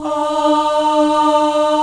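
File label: female D3, vocals; Greytsounds VOL-1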